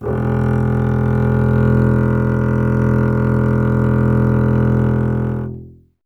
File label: STR CBS, Solo Instruments; Cbas; Miroslav Vitous - Solo Instruments VOL-2